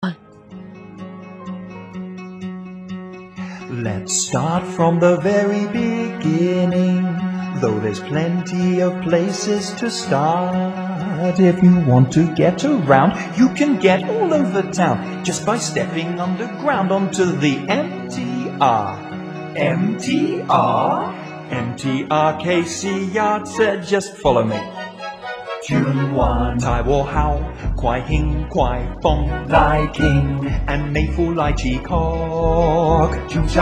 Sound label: MTR Song